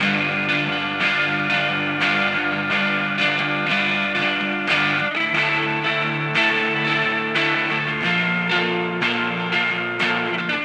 90 Gm Electric Guitar, Downtempo; Drums- Downtempo Loops & Samples; Sanctum

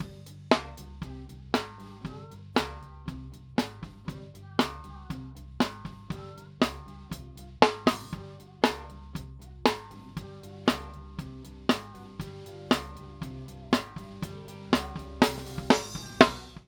Snare

